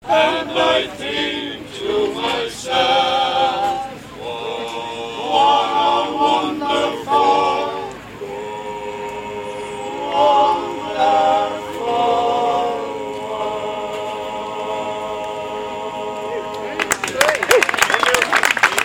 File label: mellow chords sing at the garden of plenty at bloom